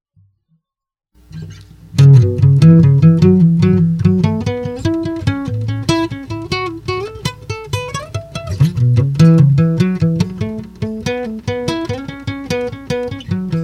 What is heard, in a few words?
bass solo